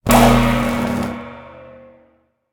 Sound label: land; smite; raijin